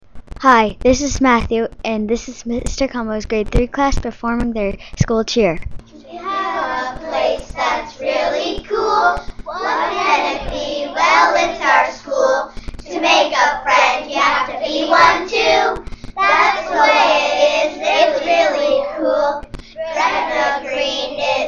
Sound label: cheer